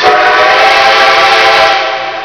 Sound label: rdg 6 chime